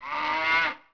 cow; Ambient; MX vs ATV Unleashed

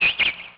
amb bird; Tony Hawk's Project 8; XBOX

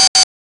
Shield Gone, Menu

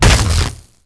shock baton impact

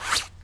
rope rappel end

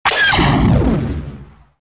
tie fire, weapons; tie fighter

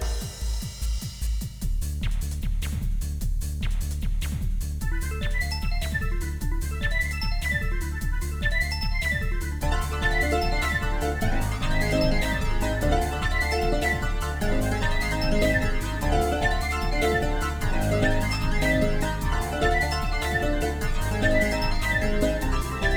music race; TyTheTasmanianTiger3; XBOX

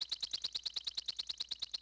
bird; Ambient; MX vs ATV Unleashed

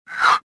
jump; Star Wars Jedi Knight II Jedi Outcast; XBOX; shadow

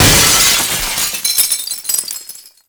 hit glass pane; hits; Tony Hawk's Project 8; XBOX

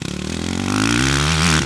Low, Engine

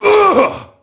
merc death; enemy; Max Payne

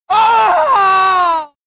death, prisoner

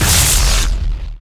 blast; Player FX

